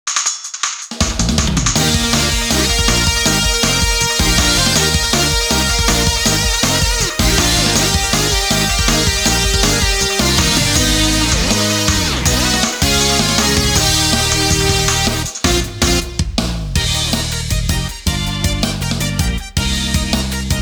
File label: Karaoke Version; Yakitate Croissant